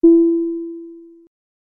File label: Elevator